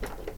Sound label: handle move